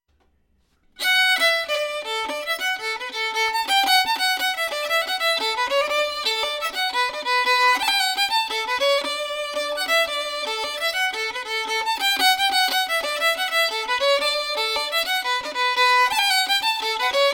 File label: fiddle; before new rigged ship; SeamusGrantFestival